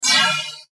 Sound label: Flash Animation Multimedia